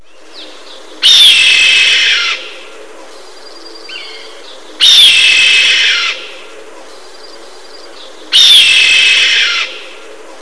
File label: red tailed hawk